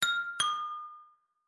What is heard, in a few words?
SMS Message Ding